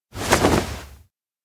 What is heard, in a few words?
fast rps cloth rub; anemoi